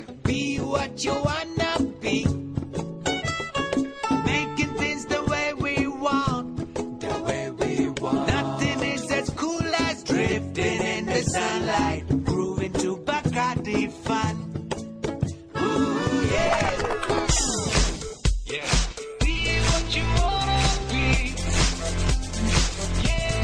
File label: Bacardi Accoustic